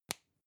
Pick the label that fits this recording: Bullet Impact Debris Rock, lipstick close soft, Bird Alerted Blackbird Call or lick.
lipstick close soft